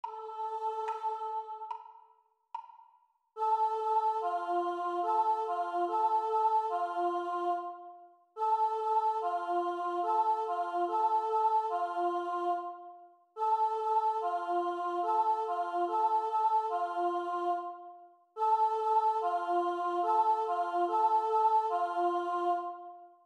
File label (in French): Chant Vx Seule